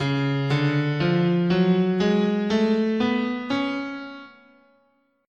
C sharp Phrygian Dominant, scale

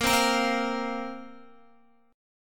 A sharp Suspended 2nd down Guitar; A#sus2 strummed

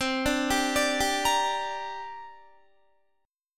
C 7th Suspended 2nd, Guitar; C7sus2 arpeggiated

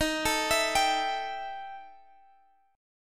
E Minor Major 7th Double Flat 5th D sharp, guitar

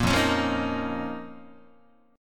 F 7th Suspended 4th Sharp 5th F down Guitar

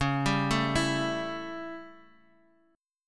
A 7th Db, chord

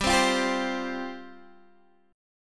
G 7th Suspended 4th G

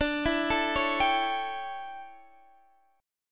D Major 7th Suspended 2nd Suspended 4th D; DM7sus2sus4 arpeggiated

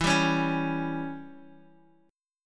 E Minor 6th E, Guitar Open D

